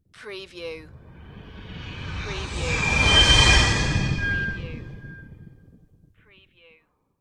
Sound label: Spaceship Pass By; Sound Effects Bible